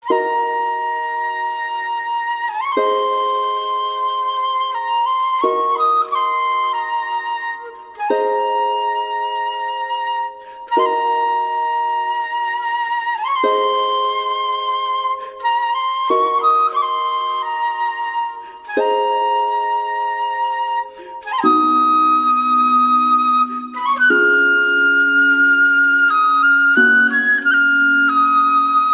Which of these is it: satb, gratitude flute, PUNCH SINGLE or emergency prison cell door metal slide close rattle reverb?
gratitude flute